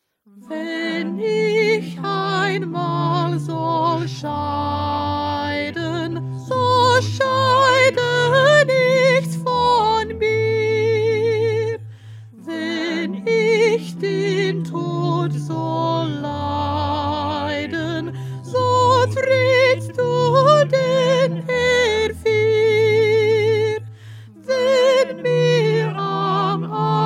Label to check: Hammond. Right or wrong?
Wrong